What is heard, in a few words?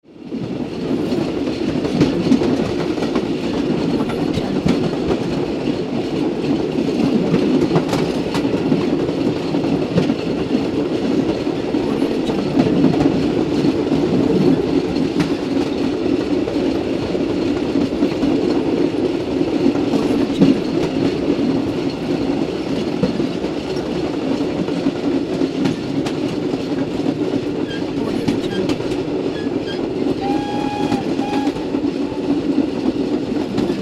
old clattering train